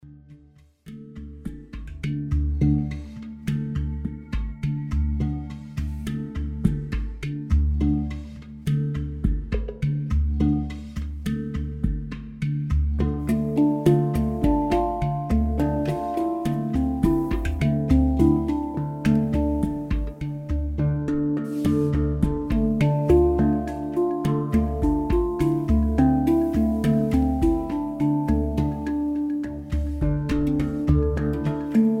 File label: real handpan feat live han